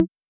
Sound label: Tom Mi, Wersi WM 24 drums